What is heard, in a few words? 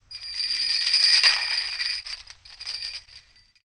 Chains